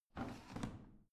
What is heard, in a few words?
washing machine drawer out